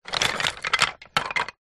leisure toy train wooden track pieces movement

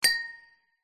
impacts glass hit ping clink reverb; zapsplat

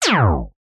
cartoon wrong answer fail descending tone

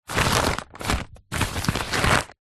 foley paper mail bills pile short movement hands; zapsplat

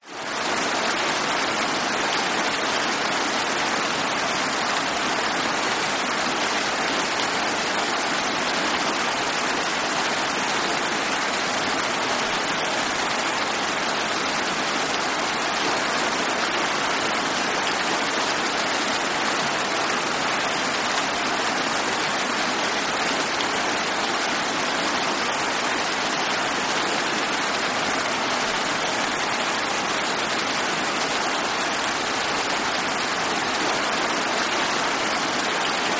nature rain heavy; zapsplat

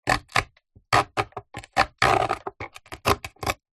wood thin scratch with sharp metal fork; foley; zapsplat

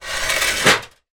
household step ladder drag

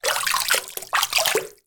household bathroom basin water movement; zapsplat

